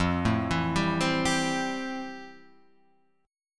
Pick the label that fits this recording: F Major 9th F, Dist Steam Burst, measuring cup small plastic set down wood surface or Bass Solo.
F Major 9th F